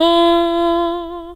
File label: singing